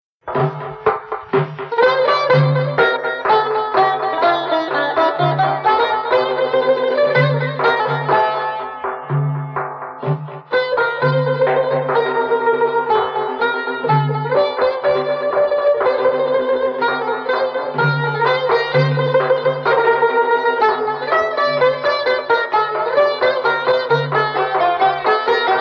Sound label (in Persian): اجرای صوتی پیش درآمد شور